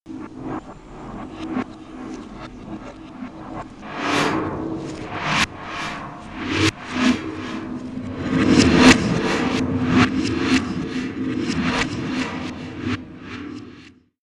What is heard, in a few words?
metallic spooky passing swooshes